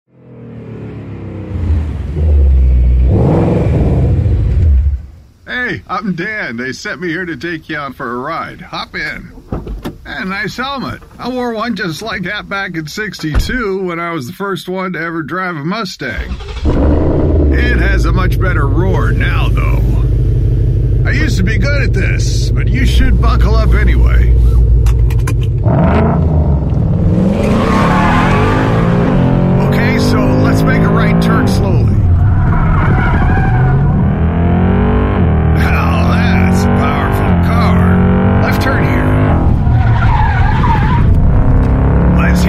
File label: THE ROARING HELMET ESCUTE CAPACETE MUSTANG